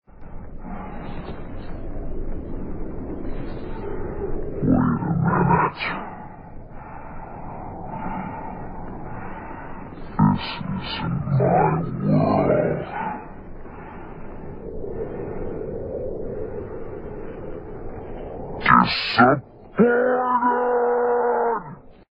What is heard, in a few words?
disappointed